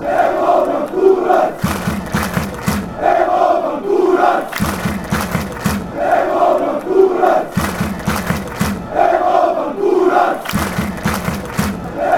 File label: slovenia chant, fanchants